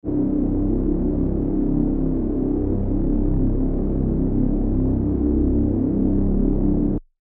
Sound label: reese